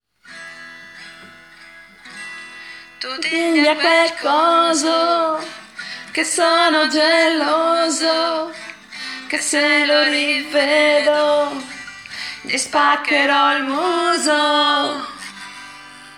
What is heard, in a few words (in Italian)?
soprano